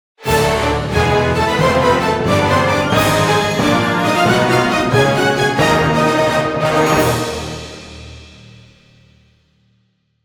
Jingle Goal; the legend of santa

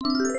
Effects Line; gamerip; Spiderman Cartoon Maker

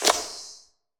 UI Menu UI ONESHOT; Monster Jam Steel Titans

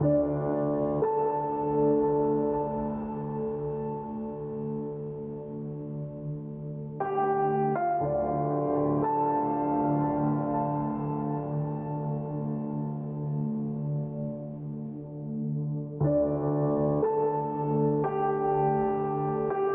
Chaos Lobby; Theme Rim

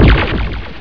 Energy Ball Explode; gamerip; Mars Sortie

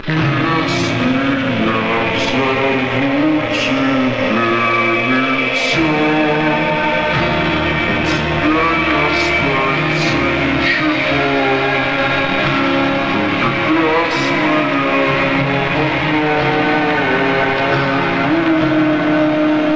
Juke Music

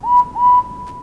Peep peep; Navigation

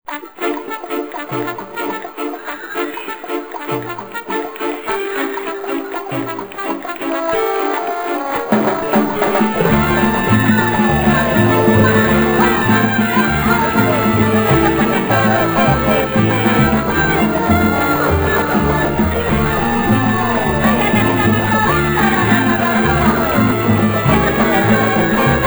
Radio Song; Smeshariki Museum of Communications

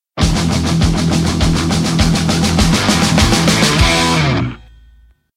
Jingle Groove; the muscle hustle; android ios

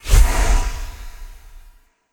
Magic Spell

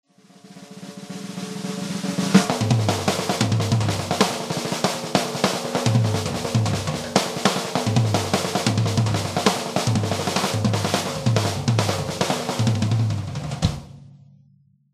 Goals Complete; Buildalot

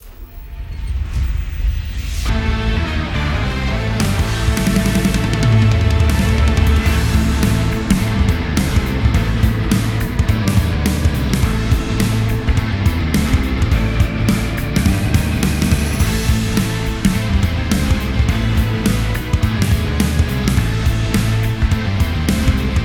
Battle; Black Beacon

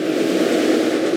roar; hybrid rc

